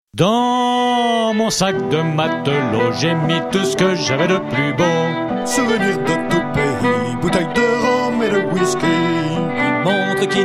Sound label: harmonica